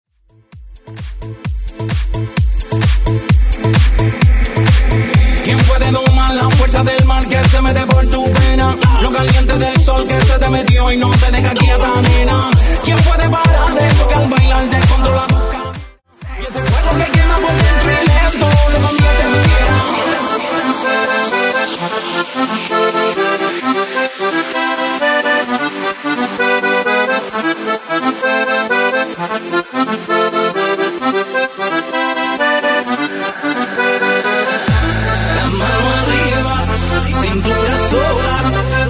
130 BPM

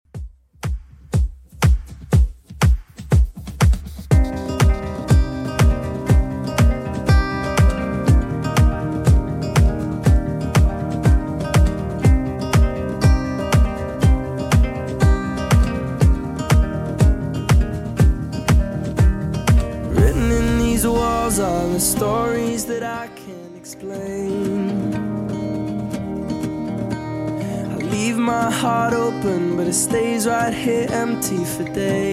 2ks Redrum 121bpm

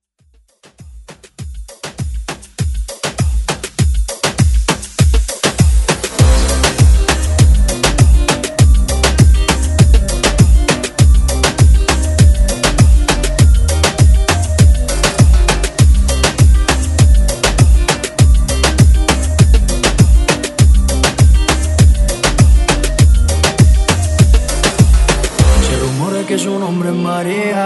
Moombah; Redrum

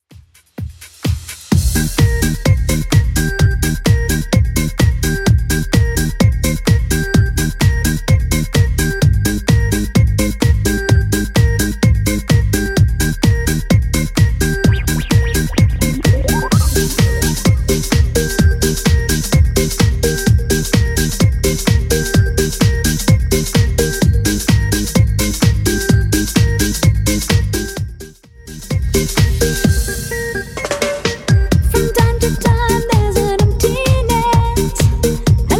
90s Redrum 128bpm

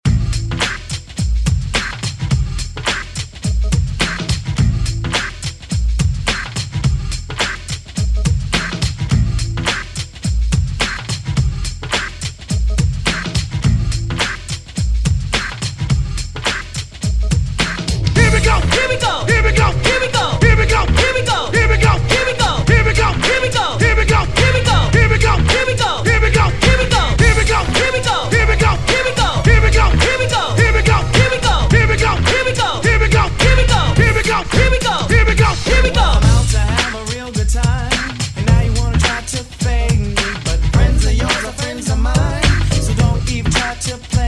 DJ Intro Outro Hype Edit 107 Bpm; DJDOLLS

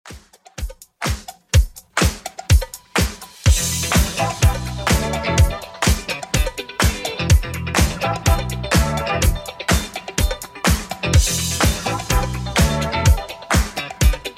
80s Redrum 125bpm CLEAN

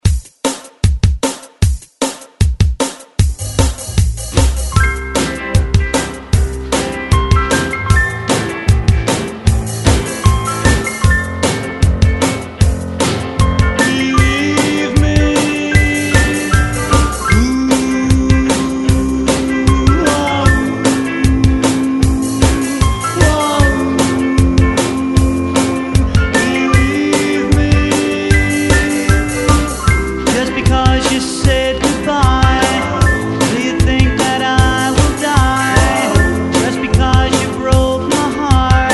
Re Drum 152 Bpm; DJDOLLS

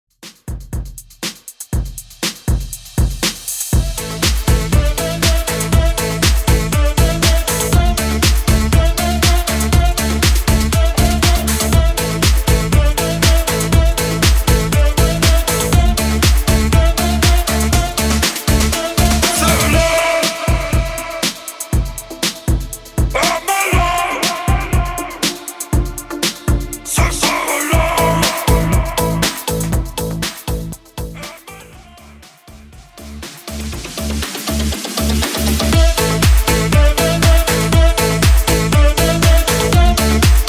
HOUSE MIX 120 BPM

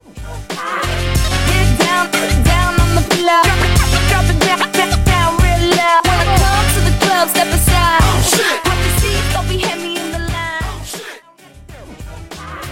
Old School Hiphop Megamix

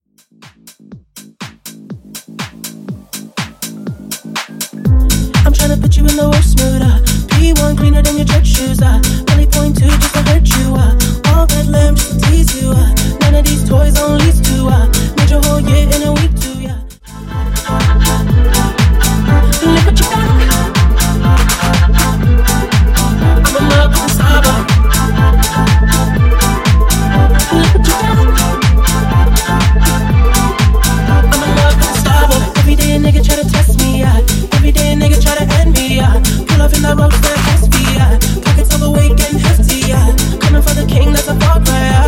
Upbeat Bootleg; Remixfreakz

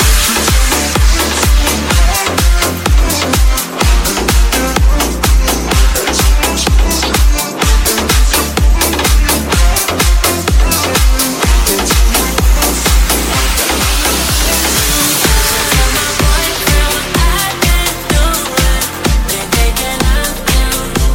Club Mix 126bpm Gm

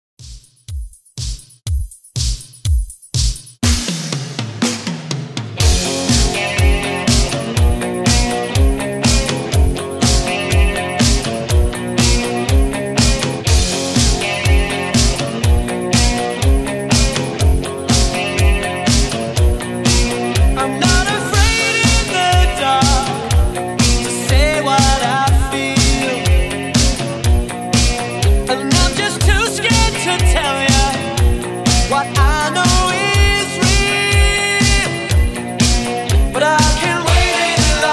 80s Rock 122Bpm

